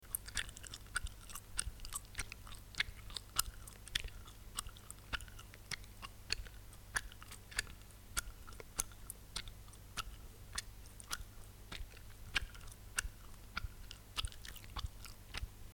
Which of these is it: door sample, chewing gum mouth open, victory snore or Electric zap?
chewing gum mouth open